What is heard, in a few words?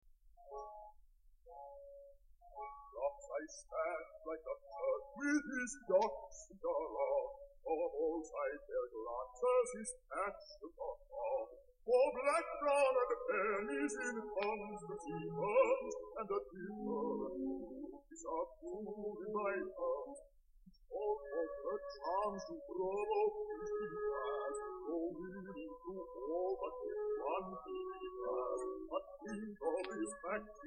air